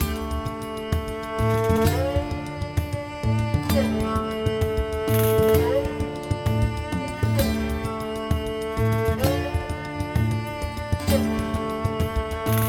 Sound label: Bard Group Tavern